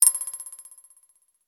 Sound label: plastik, message tones